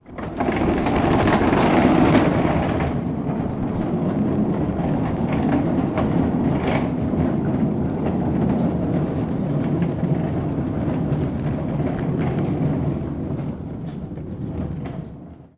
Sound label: industrial dolly cart heavy trays push; sound effects one